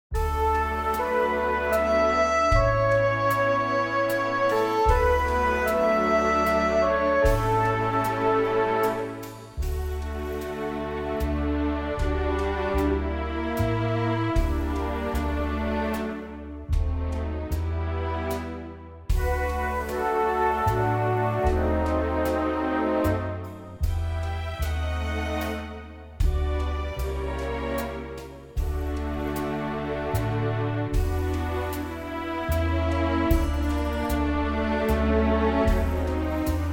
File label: D orchestral; key - D - vocal range - A to C#